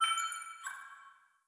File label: Node Line Open; blue archive